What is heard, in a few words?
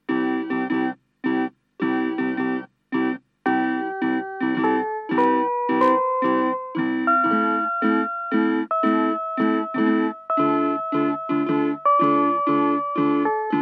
melody